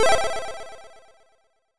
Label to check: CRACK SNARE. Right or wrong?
Wrong